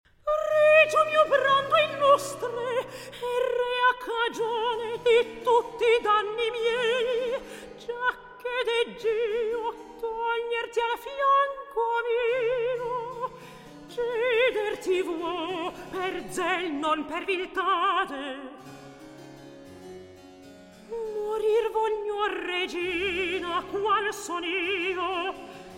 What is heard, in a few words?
Recitativo; Alceste, Martesia